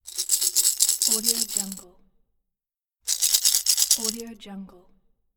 piggy bank shake many coins inside

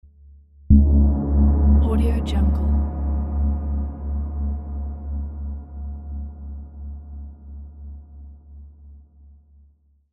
cinematic gong

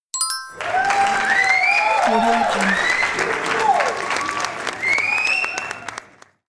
correct answer bell and cheer

